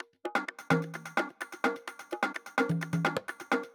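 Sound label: Drum Loop 128BPM Percussion; VEC5 Drumloops; VEC5 Multi Loops; Loop Elements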